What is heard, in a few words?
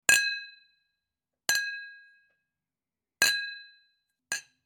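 sounds of clinking at a celebration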